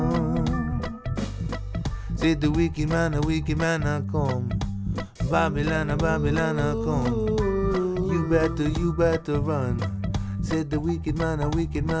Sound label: noisy, vocal